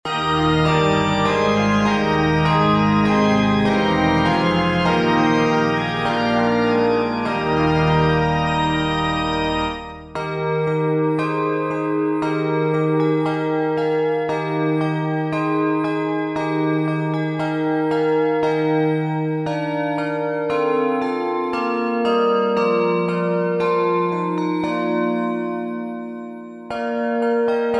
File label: for english handbells and pipe organ; sanctissima